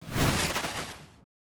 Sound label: EMP Ancient Bolt Cast